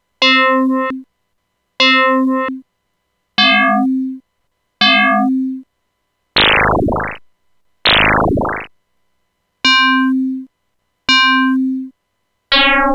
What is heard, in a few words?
FM examples; PIC32